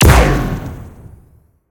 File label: Grenade Launcher 2 - Hit; Future Weapons 3